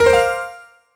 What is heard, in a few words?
Success Player; Mansion; Twitch Escape